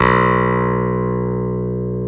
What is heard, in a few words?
Clavi B2; Sound & Vision; Gigapack I CD 2